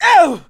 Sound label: Voices Male HurtGrunt